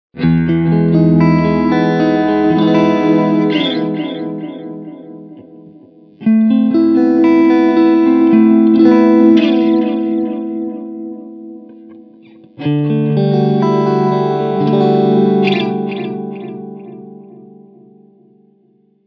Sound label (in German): Echo kurz